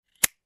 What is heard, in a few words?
Lighter Switch